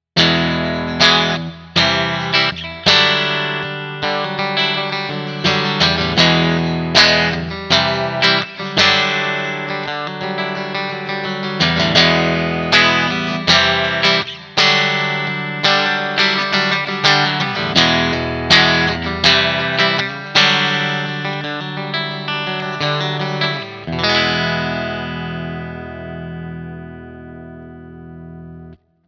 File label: Fender AM Orig. 60 Bridge clean